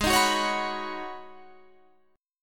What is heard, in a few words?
Ab Minor 11th, Ab; Abm11; strummed; 16,18,16,18,19,19